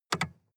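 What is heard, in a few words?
sports car interior lightswitch double short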